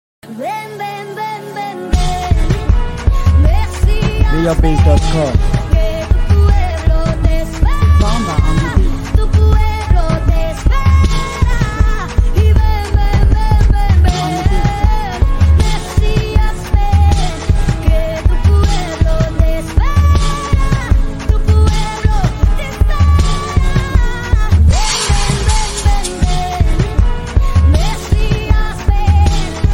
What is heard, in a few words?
Mara Dance Beat